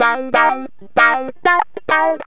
GTR, CHANK; Zero-G; Funky Elements

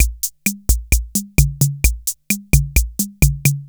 KR33 MAMBO; Crypton - DrumBase5000

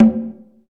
PER, Percussion

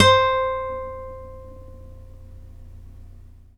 NYLON P C4, GUITARS; Synclavier Sampler Library

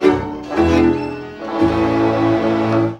VIOLIN HITS; Best Service; World Colours

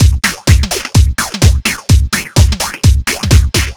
Funk Full, Drum Loops 127bpm, Nu Dance Electro; Zero-G; Soundclash Nu Dance Electro; Acidized Wavs; Jumble Funk

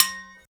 Percussion, House

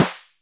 SNARE, DRUMS; Music Store Sound Service; Einstein

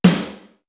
VERBOSE, TOM; East West - Global Sounds; D4 BD SD TOM

